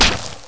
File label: grenade hit; weapons; XDM